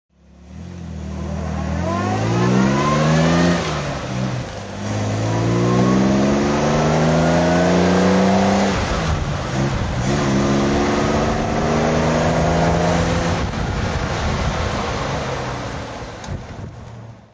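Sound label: Jaguar XK140 S drive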